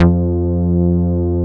OB TITE F3; Drums In Your FACE!

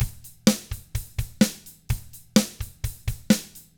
Double Platinum Drums, surf rock; ILIO; Double Platinum Drums 1

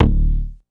Clock Bass, Old Skool House; Big Fish Audio; OSH Bass

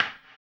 HAMBONE, Vocal Blues Soul; Spectrasonics Vocal Planet

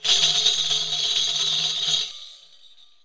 RIQ ROLL, HAND DRUMS; SoundBytes - Early Patches

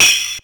Tamb, Ensoniq ASR-X Pro

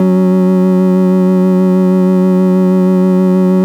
FARFISA, F#2, Vintage Keyboards

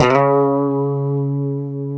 C#3, Guitars; Spectrasonics; Hans Zimmer Guitars VOL-1; 90's Sample CDs; COMP POP+VIB

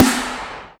Snare, Alesis DM5

